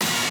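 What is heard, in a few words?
b stab1b, note A octave 5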